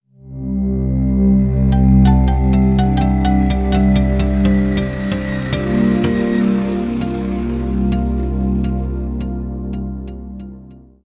airport; Virtual Seattle 2000

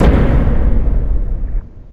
drums giant

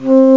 FLUTE

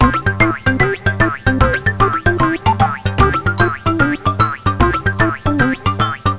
Bouncy Theme

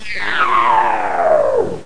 wraith

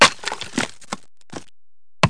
AXE NEW; My Science Fair

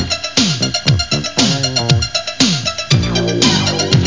bass break

